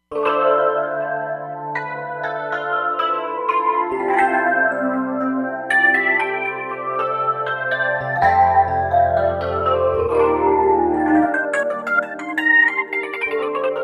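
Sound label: Backing Phase Electric Piano, Roland Fantom XR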